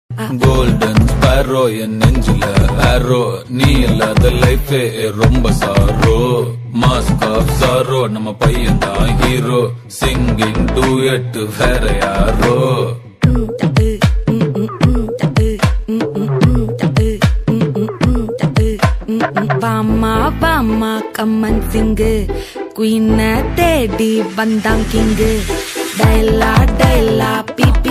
bgm ringtone tamil bgm ringtone tamil love bgm ringtone love ringtone